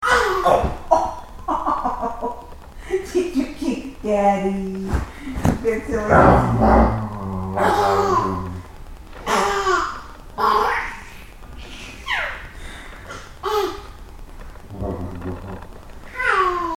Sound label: giggling